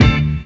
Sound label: Guitar Stab One Shot, Trip-Hop; Sonic Foundry; Rondo Brothers Trip-Hop Loops & Samples; Liberation